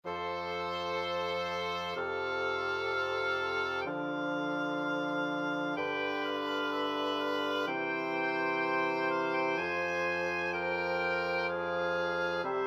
flexible mixed ensemble, 4 players

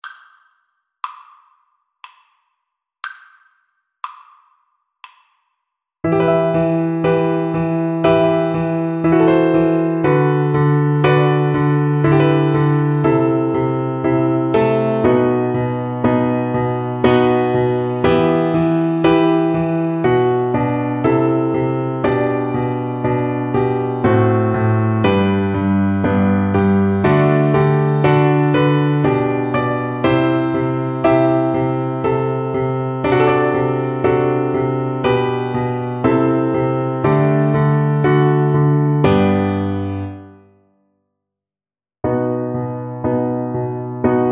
sonata, Cello; marcello

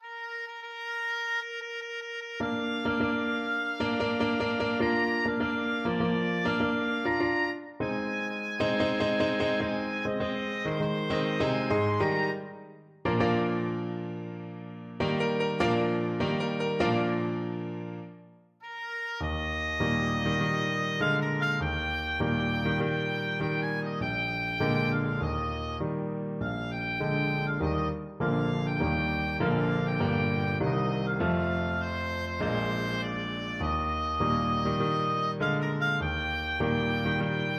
aida march, oboe

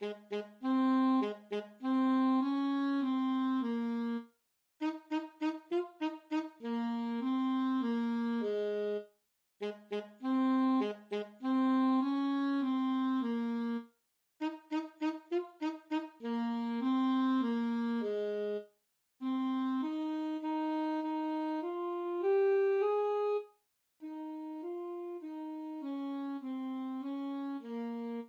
saxophone

